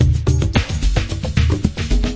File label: thick echoes, 110 bpm, drum loop; 101 to 110 bpm